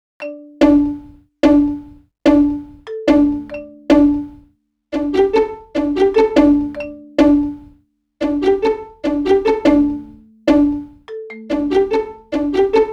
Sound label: Vibes Strings Combo; Sonic Foundry (Sony Creative Software); Cinematic Funk Urban Tone Poems; Session 05 - The Triad